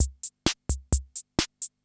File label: CR78; Best Service; XX Large Most Wanted; Sample CDs